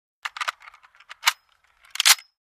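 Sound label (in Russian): вставляют магазин в винтовку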